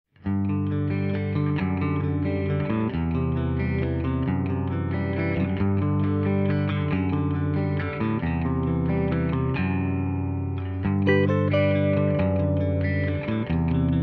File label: music